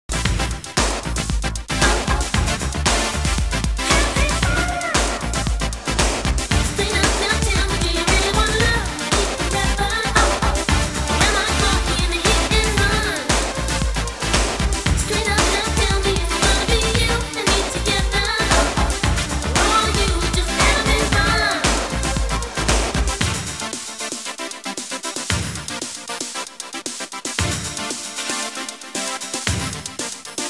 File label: house mix